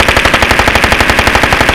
Inf Weapons; Red Orchestra 41-45

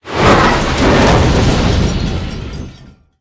tanks explosion metal; War Thunder

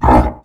commando punch

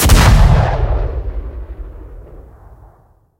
aa fire; guns; War Thunder

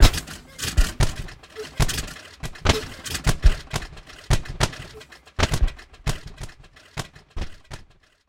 overheat

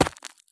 sprint gravel; Escape from Tarkov

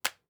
ui drop meat; Rust

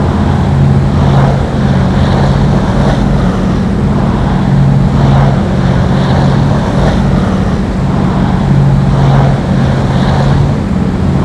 cargo plane loop, vehicles; Call of Duty Modern Warfare

